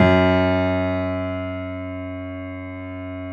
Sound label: JAZZ F#1 F, Keyboards Acoustic; USB Soundscan vol.17